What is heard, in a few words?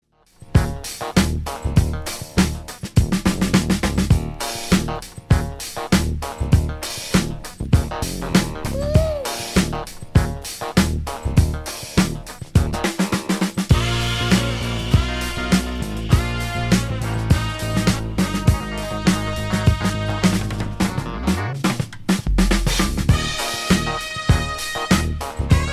instrumental